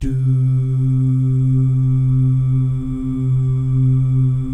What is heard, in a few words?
"doo" guys, Vocal Jazz Fx; Spectrasonics; Vocal Planet Vol.3 Jazz Fx